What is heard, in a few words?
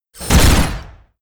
war attack; HOBOMITE